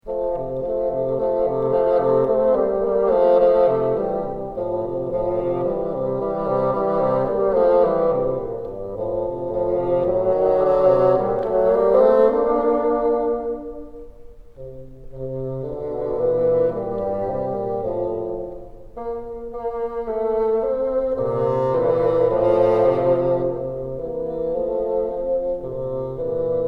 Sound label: together, bassoon